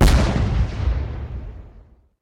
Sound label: large Blast far, fireworks; HunterZ G4; minecraft